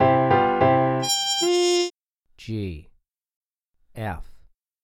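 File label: Muted Trumpet 2 Note Melodic Major g4 f3; melodic ear training; melodic ear training for musicians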